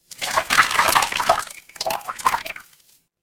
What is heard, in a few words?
water swirl